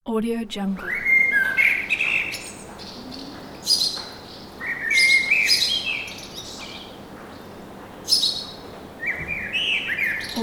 urban city backgrounds; snowflakeaudio

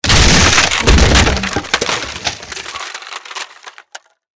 large wood smash

